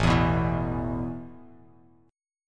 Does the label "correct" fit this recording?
No